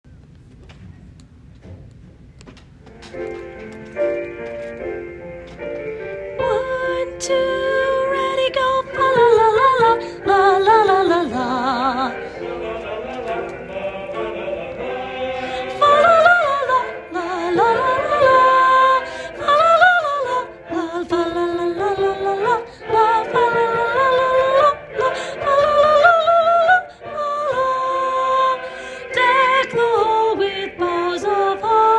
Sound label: soprano